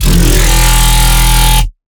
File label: Monster, Dubstep Bass Hits; Ghosthack; Advent Calendar 2017